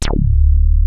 WEST HARD D1, ANALOG BASS; Music Store - Claudius Diemeter Schwerlos

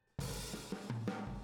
Boogaloo - Room M, 82 BPM; Drums; Intro; Sonic Foundry; Drums from the Big Room The Mixes

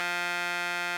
RMI, RMI EP; Sonic Reality; Vintage Timetraveler

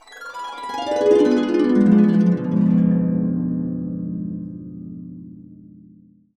D DIM7 UP DN, Harps

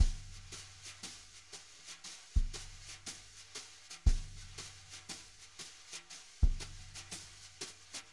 jazz brush loops

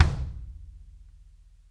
KICK; Sonic Reality; Interactive Drum Kits; 90s sample cds

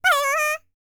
C ASIA, World Voices

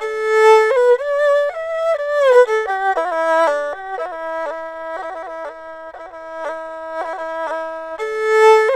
ERHU, 120; G; INIS; Opium

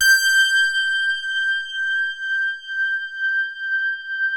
FANT HARD G5; Music Store - Claudius Diemeter Schwerlos; FINE ART 2

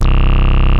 HITEC HI C1, ANALOG BASS; Music Store - Claudius Diemeter Schwerlos

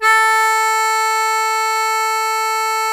HARMONICA A3; Sonic Reality; American Heartland

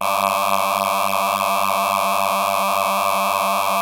PPG VOX D G3; DIG VOX; Music Store - Claudius Diemeter Schwerlos

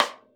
Raw Rim, Drums Acoustic; ALL RIM; USB Soundscan vol.10; AKAI; 90s sample CD

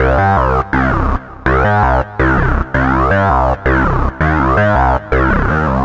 Synth Bass

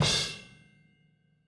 Boogaloo - Outro - Overhead, 82 BPM; Sonic Foundry; Drums from the Big Room The Mixes

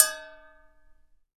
Metal Cup, Drums; PX7; Digital Sound Factory Protean Drums

